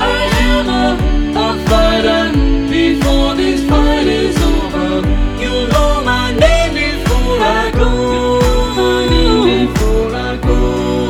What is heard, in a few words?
SAATB choir band all voices